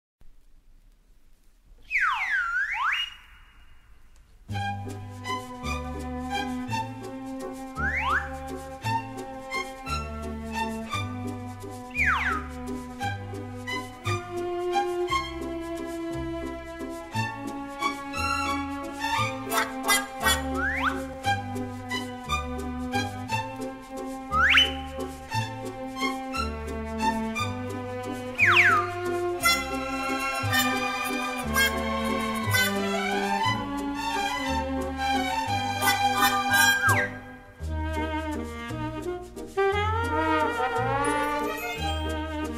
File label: Mall Music